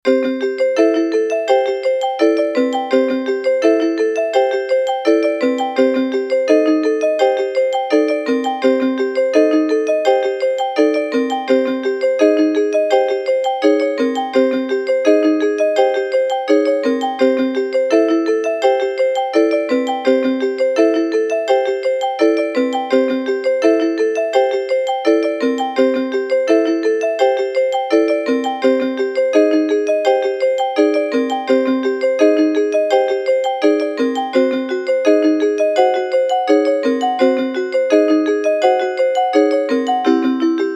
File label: Tenori Scales